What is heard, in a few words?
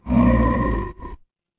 horror growl